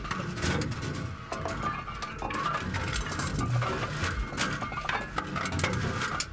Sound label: gun reload; World of Tanks